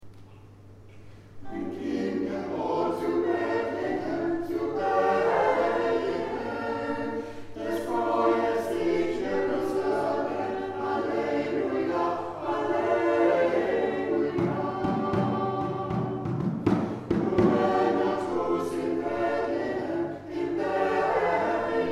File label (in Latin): Puer natus Chor; Orgelbuchlein